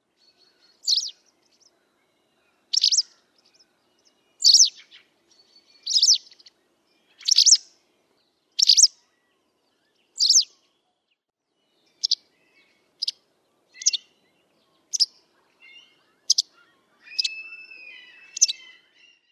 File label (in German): bachstelze